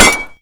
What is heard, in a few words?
hit, crossbow